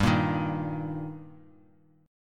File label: G Minor Sharp 5th G down Bass Standard; strummed; JGuitar